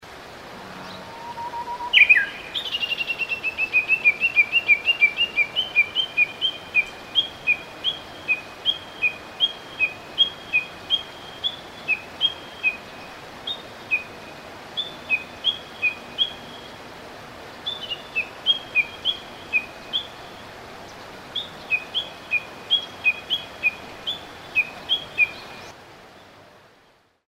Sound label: bird uguisu